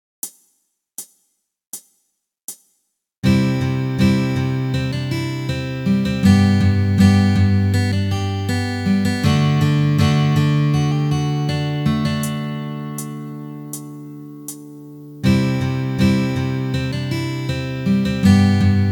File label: Sweet Melody, guitar